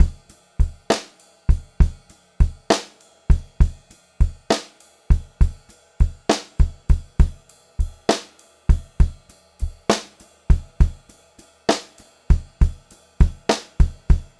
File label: Pop-Rock Drumloops, 100